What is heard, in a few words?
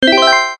WIN; Joker Poker